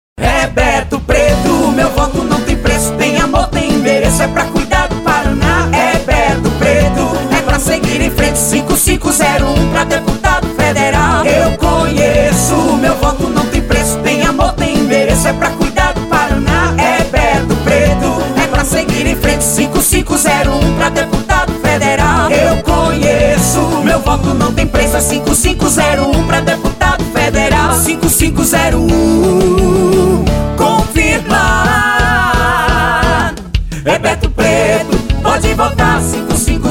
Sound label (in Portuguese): Jingles Refrao; Beto Preto; comite digital